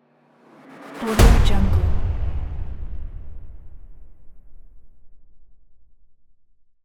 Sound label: cinematic hit